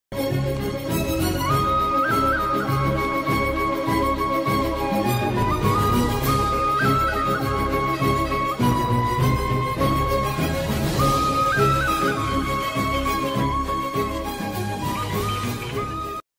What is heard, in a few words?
bgm ringtone; nani; sai pallavi; mca movie